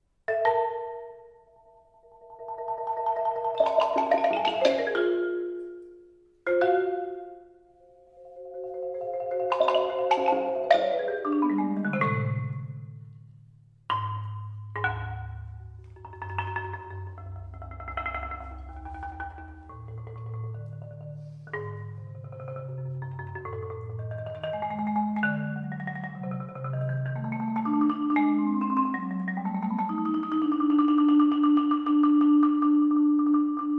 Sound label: Marimba